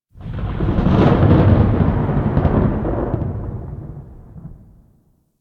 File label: Thunder; Warframe; The New War; Narmer Outpost